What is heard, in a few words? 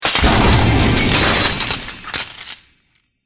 Magic Spell Destroys Wall; Magic Sound Effects